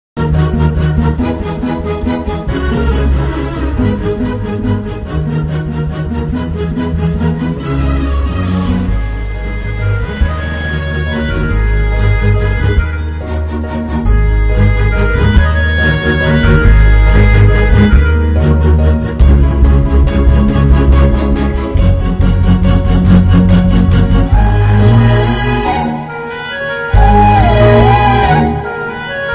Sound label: heart breaking love music bgm